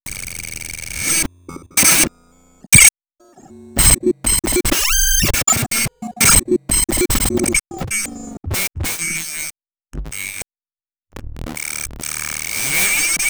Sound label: glitch thing